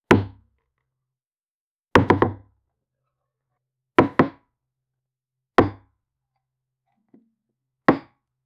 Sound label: a wooden table breaking